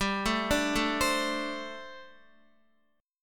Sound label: G Suspended 2nd Suspended 4th, Guitar; Gsus2sus4; arpeggiated; x,10,7,7,9,7